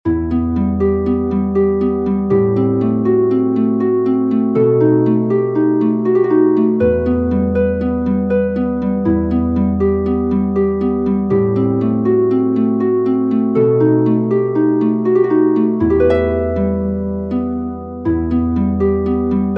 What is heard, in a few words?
study minor for harp